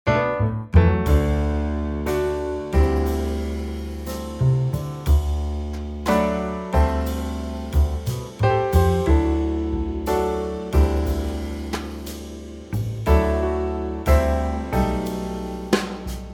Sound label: D Trio